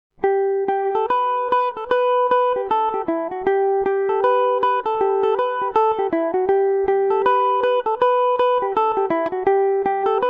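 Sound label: reel G major revelation, Irish mandolin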